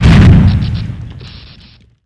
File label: death; needleman